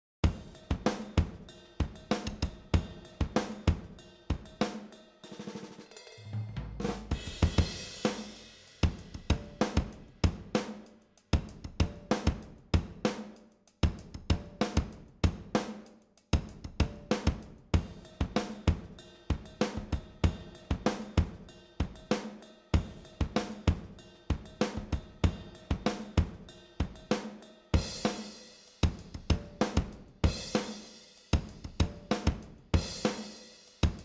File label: 70s Open Full Rock